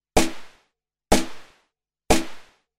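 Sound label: electronic snare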